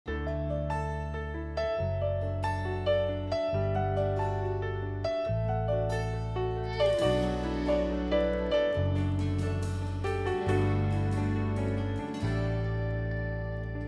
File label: Anthem Sing Along